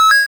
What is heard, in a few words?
coin